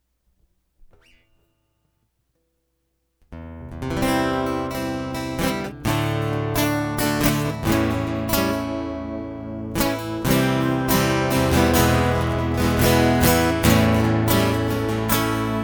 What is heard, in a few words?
Instrumental